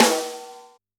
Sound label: Snr, drum